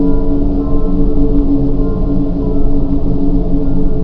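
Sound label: city ambience; hl2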